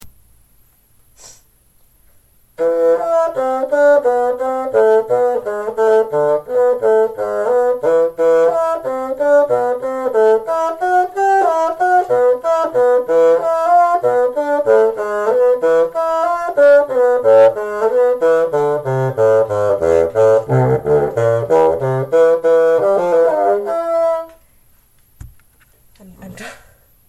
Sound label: band recording